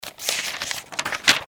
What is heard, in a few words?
paper noise